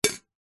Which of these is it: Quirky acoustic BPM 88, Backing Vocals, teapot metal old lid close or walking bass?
teapot metal old lid close